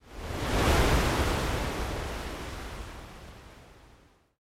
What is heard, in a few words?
Wind Door; Game rip; MiSide